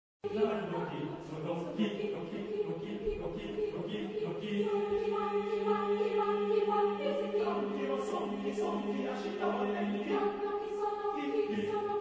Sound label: chorus; Matsushita; Japanese Game